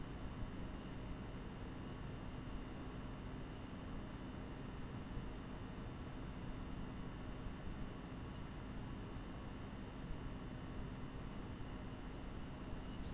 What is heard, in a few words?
Gaming Noise